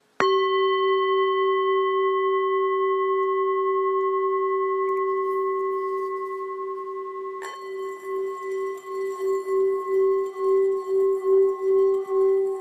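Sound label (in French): bol bengale 5-600g Do, Bol chantant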